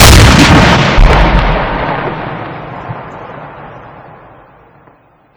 artillery fire; SuperPower 2 Redux V7A; unit

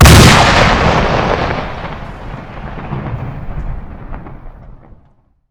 explosion large; SuperPower 2 Redux V7A; unit

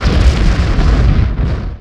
nuke; SuperPower 2 Redux V7A

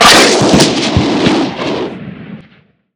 missile fire; SuperPower 2 Redux V7A; unit

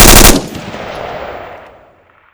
mg burst; SuperPower 2 Redux V7A; unit

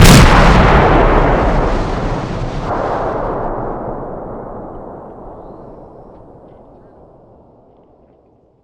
explosion huge; SuperPower 2 Redux V7A; unit

